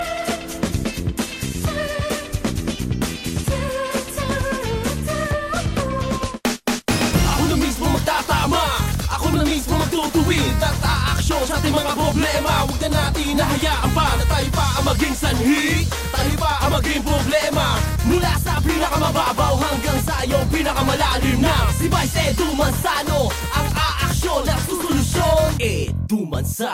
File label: Jingle; Edu Campaign